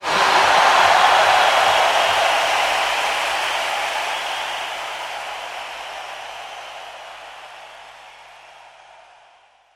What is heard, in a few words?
Crowds; Vengeance Samples; VEC2 FX